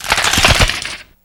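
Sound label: bone crack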